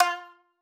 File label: marimba shamisen; ゆめ2っき